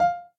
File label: button Up; netent; copycats